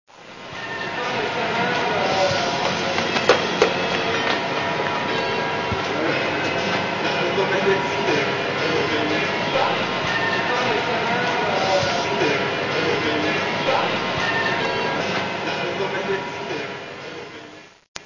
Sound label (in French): ambiance quai metro